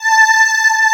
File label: DIDI PAD, SYNTHESIZER